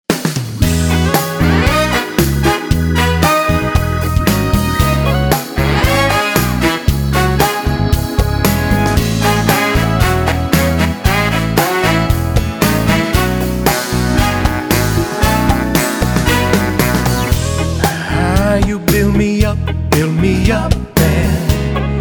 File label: Carolina Beach Music; Raleigh NC